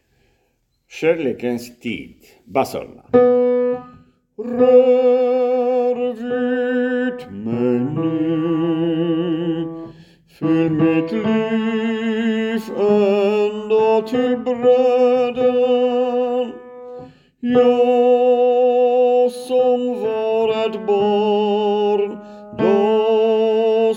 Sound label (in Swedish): bas